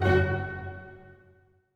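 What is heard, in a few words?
orchestra; Masterbits - Switch FX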